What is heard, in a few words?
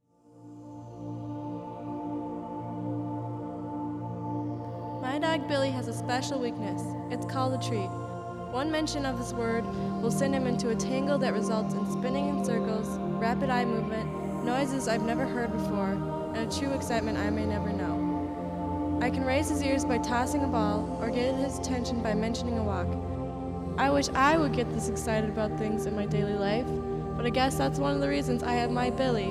tango